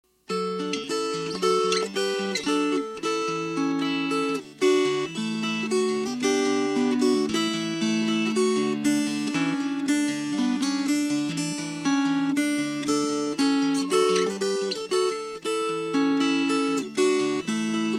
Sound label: Fingerpicked